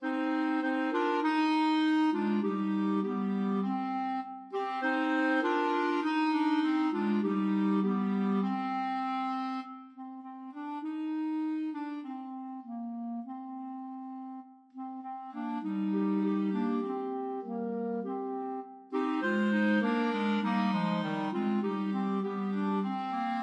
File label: crown roses clarinet, clarinet trio